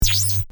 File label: Short electrifying outburst electrical noise; sound design effects